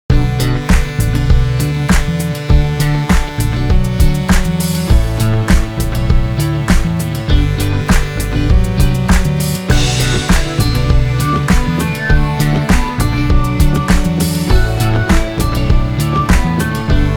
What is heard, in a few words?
instrumental